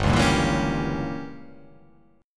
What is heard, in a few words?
G Diminished 7th; down